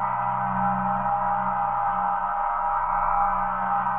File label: Tonal Re Draw, sparse soundscape